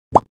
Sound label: pop cat